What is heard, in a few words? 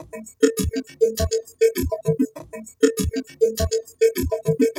Beat, Electronic Beats; Sonic Foundry (Sony Creative Software); Myths of Technology; Hollow Gate Beat